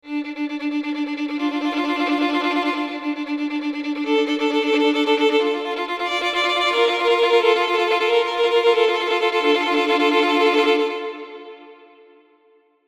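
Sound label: strings violin tremolo; captains strings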